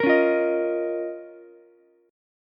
C sharp 6th Add 9th C sharp Ukulele C6; strummed; C#6add9; 15,13,13,18; JGuitar

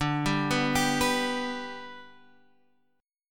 B-Minor Sharp 5th

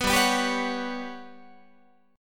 G Major 7th Flat 5th, Guitar; Listen to GM7b5 strummed; GM7b5 Chord

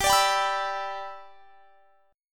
G 6th; G6 strummed; x,22,24,24,24,24

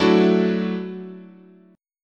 Gb Minor 7th Sharp 5th, chord, guitar

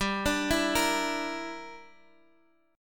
E Minor 7th Flat 5th G

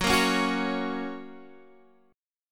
Gb Major; Listen to Gb strummed

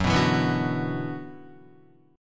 Gb 7th Suspended 4th Sharp 5th Gb Guitar

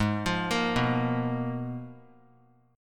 Ab Minor 6th Add 9th, Ab; arpeggiated; JGuitar

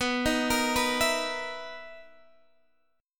B Major 11th B, guitar

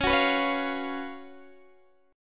Gb Major 7th Suspended 4th Db, guitar

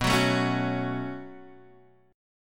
B 7th Suspended 2nd, Guitar, Half Step Down; B7sus2

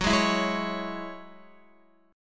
Gb 7th Suspended 2nd Gb down Guitar; strummed; JGuitar

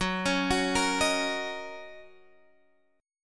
Fsharp 6th, guitar chord

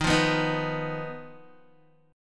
Eb Suspended 2nd Sharp 5th Eb down Guitar; strummed; 4E,4A,5D,5G,5C,6F; chord; JGuitar

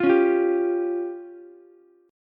F Suspended 2nd Sharp 5th, F, Ukulele; Fsus2#5 strummed

